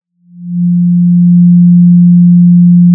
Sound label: Sound of a cruise ship horn